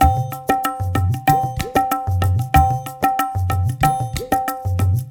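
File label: 93 bpm, udu; Misha Udu; Spectrasonics - Supreme Beats - World Dance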